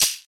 shaker d key, shaker sample tuned to the D note. Loudest frequency: 6294Hz